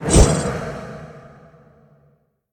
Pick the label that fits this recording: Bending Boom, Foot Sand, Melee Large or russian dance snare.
Melee Large